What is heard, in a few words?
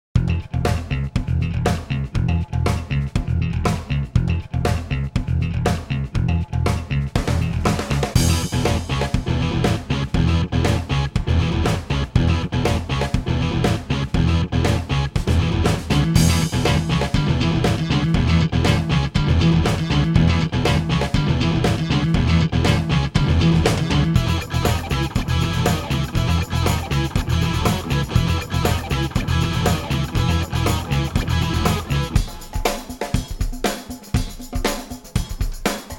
Pop Punk Instr